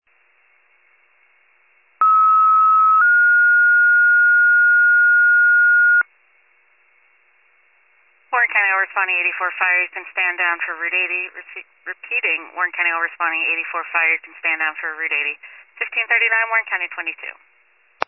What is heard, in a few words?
fire, pager alert